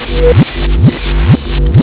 tekkno eq backwards

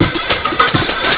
jazz double

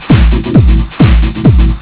tekkno

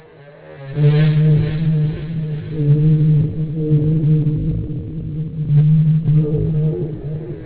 slow tekkno

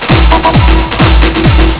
tekkno eq reverb

